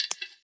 Lock; Sharp; SHL21

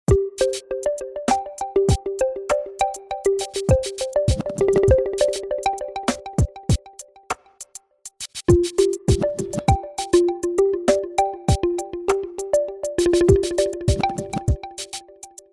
Pinball, ringtones

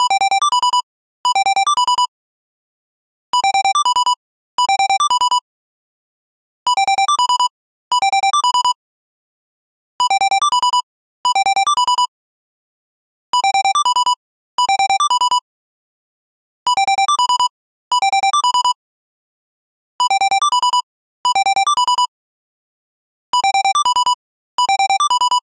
Simple Simple Bell, ringtones; LG; Velvet

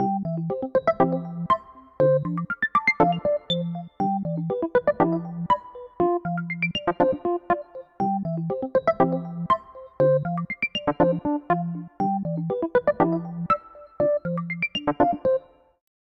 Escalator, ringtone; Samsung Galaxy Z Fold 7; Sound Theme; Fun

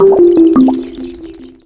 Wavelet, notifications; Honor; X5

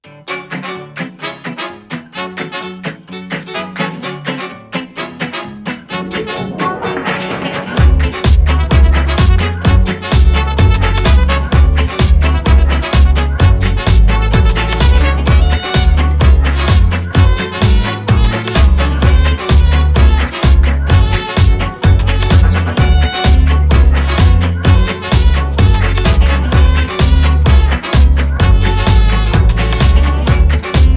Ringtone - Jazz; Proximus

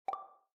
In Call Notification; ZTE Axon 20 5G; ui

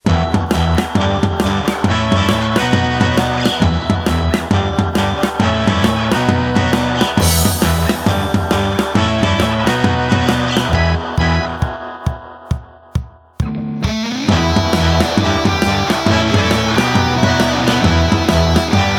60s Pop, Windows Mobile ringtones; Microsoft

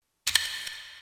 Shutter; Huawei U1309 Emobile; hwcamcorder

